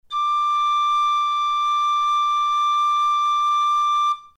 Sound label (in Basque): Txirula nota RE